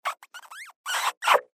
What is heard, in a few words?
Kiss